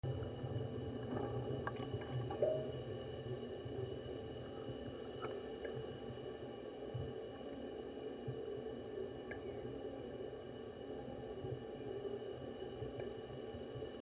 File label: good no leak; Wrights Rd